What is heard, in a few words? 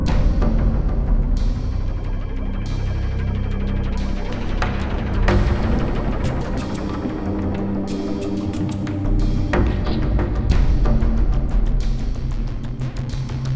music danger; freelancer-hd-edition